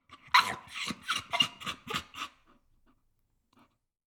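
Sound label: Dog Whimper; Coll Anderson; GDC Game Audio Bundle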